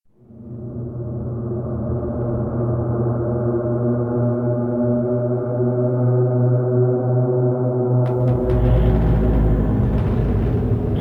Dead Ambience; Silent Hill 4 The Room

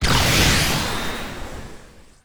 attack

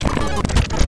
Glitch Die

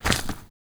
walk gravel

